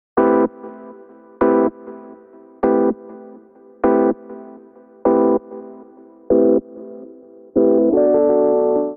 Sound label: Muddy Whirl Piano A Minor 85 bpm; MIDI Clips; Accompaniment; Ableton; Chop and Swing